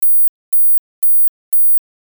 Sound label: 19khz